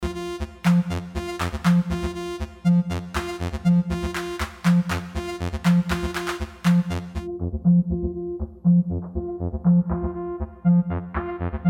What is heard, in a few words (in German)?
Teenage Engineering TX 6 Synth Sequenzer